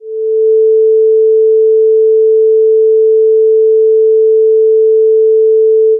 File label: sine 440Hz